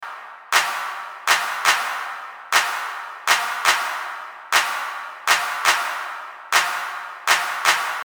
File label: Convolution Reverb Pro St Albans Cathedral